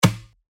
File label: D, percussion; Festival; revealed percussion vol 3